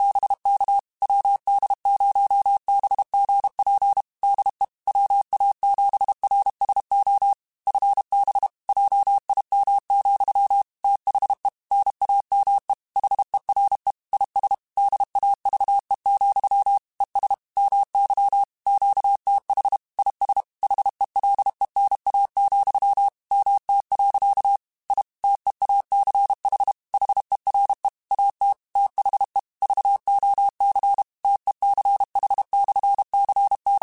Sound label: Morse Code, QSOs; HamRadio; CW Code Groups; WD0BGP